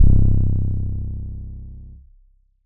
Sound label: low note